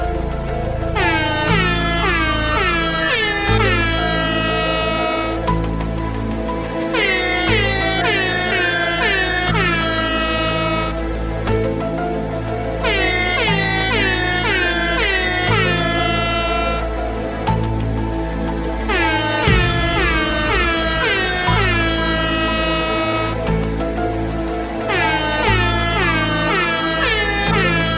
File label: SPOOKY AIRHORNS